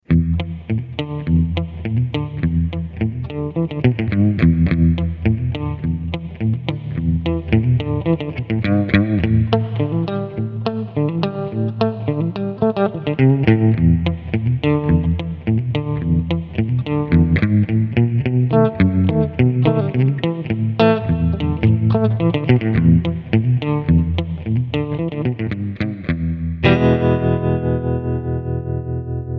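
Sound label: black panel tremolo reverb; Micro Cube red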